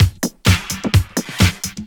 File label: Housebeats 128BPM; Vengeance Samples